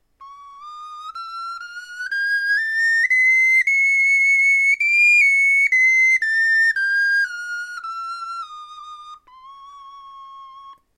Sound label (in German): tonleiter, knochenfloeten